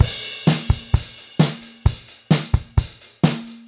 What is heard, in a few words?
Beat Loops, 130; Zero-G; Monster Beats